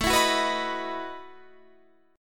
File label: A 6th Suspended 2nd Sharp 11th, guitar; strummed